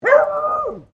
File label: ANIMAL DOG BEAGLE FEMALE BARK; Various domestic dogs; Dogs (Sound Effects:Animals)